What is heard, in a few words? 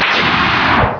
slide; SubZero